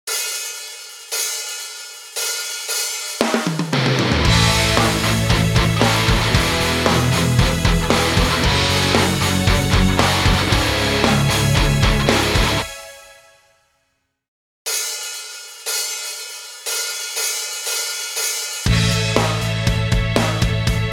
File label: karaoke